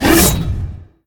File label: activate; Smite; Tiamat